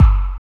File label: DR. LOOP, DRUMS; X-Static Goldmine 3